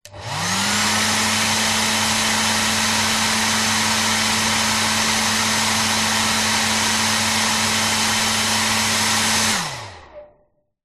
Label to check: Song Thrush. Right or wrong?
Wrong